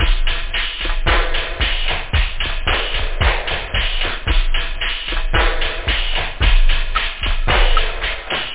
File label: techno; OctaMED SoundStudio V1